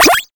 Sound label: cartoon squash